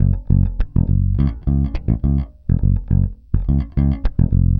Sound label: Pop, Bass